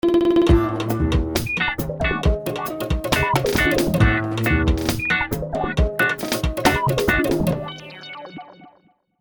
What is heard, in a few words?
Hi Tech Rhumba; Produced by Maestro-Matic